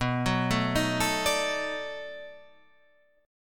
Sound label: B 7th Sharp 9th, down, guitar; arpeggiated